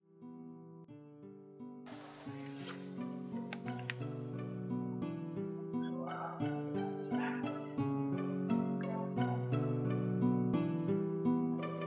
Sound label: rap